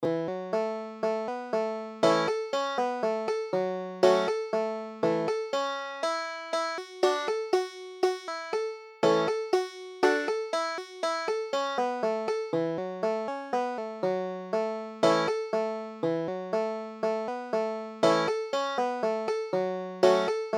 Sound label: banjo